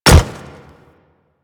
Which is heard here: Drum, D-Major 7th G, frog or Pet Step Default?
Pet Step Default